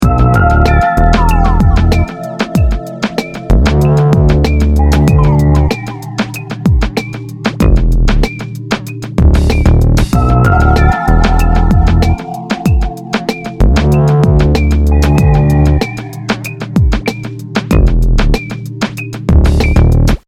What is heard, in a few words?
Music loop